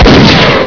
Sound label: shot, weapons